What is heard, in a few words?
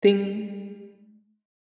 Success